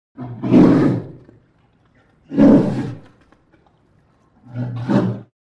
Bear Polar Growls

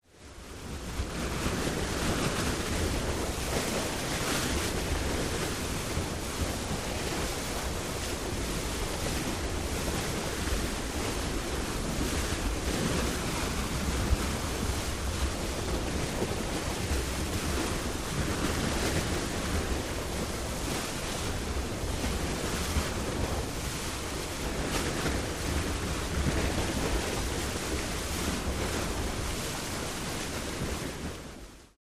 Ferry Boat Steady

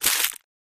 Plastic Crush Shrt

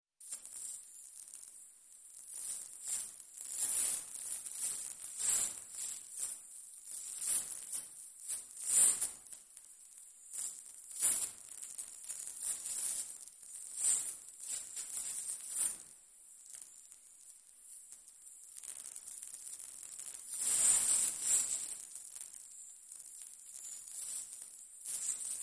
Elect Arc Buzzing

